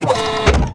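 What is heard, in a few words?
weapon load; forsaken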